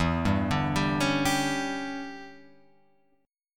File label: E Minor 13th guitar chord, Open F; Em13 arpeggiated; JGuitar